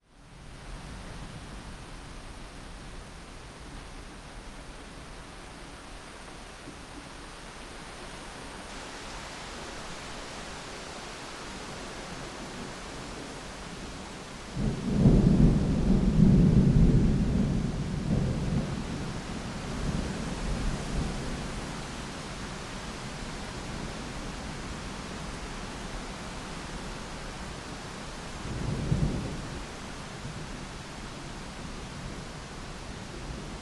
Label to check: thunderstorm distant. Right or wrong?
Right